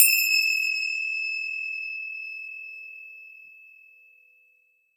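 finger cymbal, Synclavier Percussion, World & Orchestral; ILIO; Synclavier Percussion 2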